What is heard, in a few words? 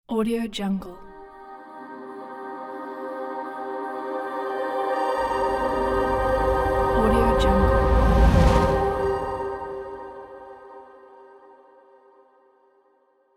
choir logo